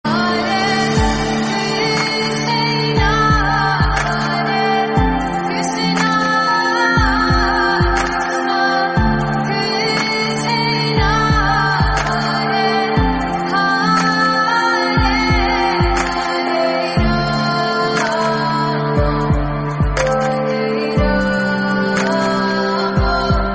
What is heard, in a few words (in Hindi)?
Hare Krishna Hare Rama Slowed Reverb, Hindi